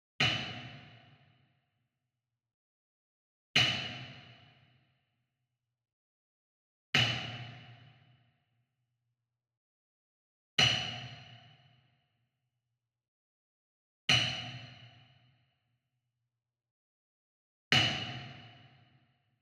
WOOD PERCUSSION Wood Block Traditional Resonant Hit Far; boom library; Cinematic Strikes Construction Kit